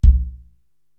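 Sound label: bass drum Acid Jazz, Korg TR-Rack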